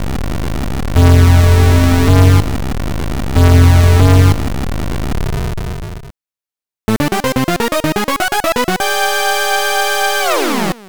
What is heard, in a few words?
Mini-Boss Intro; virtual boy wario land gamerip